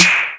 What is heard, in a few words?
Snare Clap; Zero-G Club Techno; Percussion